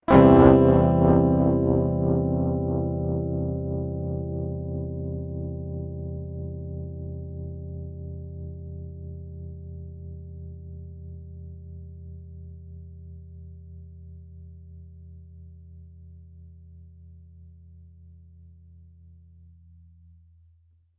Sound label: Cadd2 up; Hylics